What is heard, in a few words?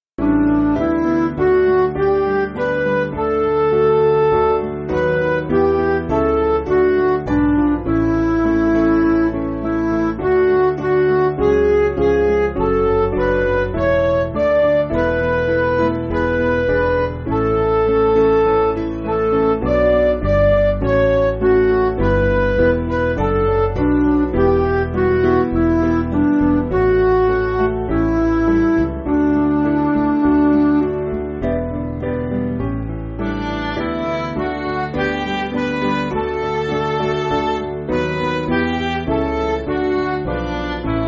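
Midi, Music Backing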